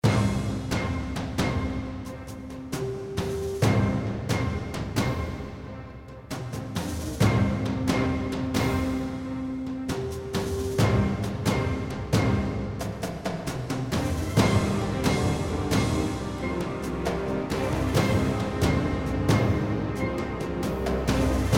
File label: Orchestrated; orchestrated jingles